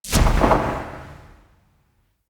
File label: Bullet Jump C; Characters